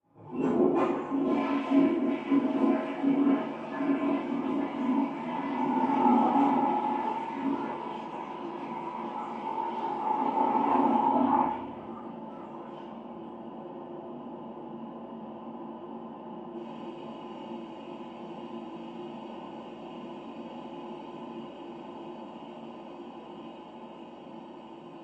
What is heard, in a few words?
Toilet Flush Water, pipes